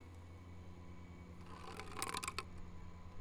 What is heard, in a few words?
rolling bottle